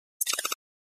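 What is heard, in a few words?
Flash Gear Electronic Bubble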